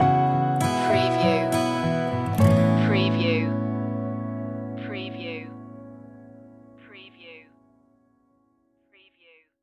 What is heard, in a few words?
heart to heart stinger